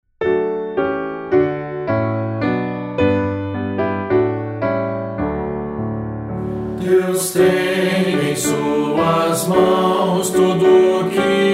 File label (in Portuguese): voz piano